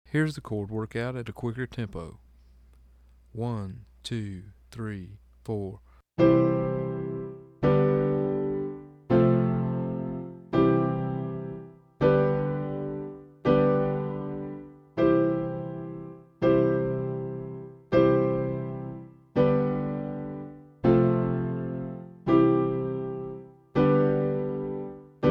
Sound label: Chord Workout Fast